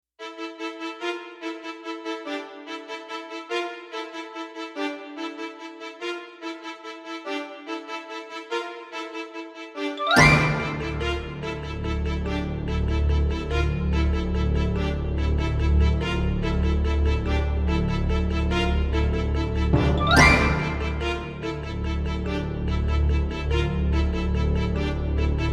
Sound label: Roland SC-8850; Doom; Doom Revisited